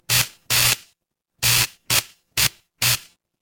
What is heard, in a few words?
fire electric spark